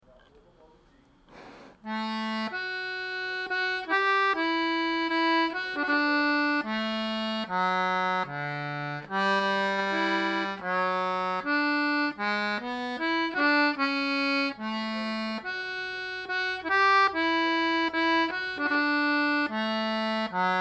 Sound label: baritone